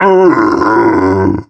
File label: Scrake Infected; zombie plague